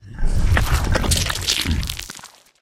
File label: flesh eat, monsters